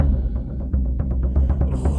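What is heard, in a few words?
Music Shield; from dust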